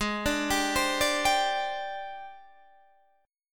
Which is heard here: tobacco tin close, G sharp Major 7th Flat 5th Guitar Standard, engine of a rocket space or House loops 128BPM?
G sharp Major 7th Flat 5th Guitar Standard